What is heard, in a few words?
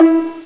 hi pizzi, string instruments; Sound Effects for Multimedia - Volume 1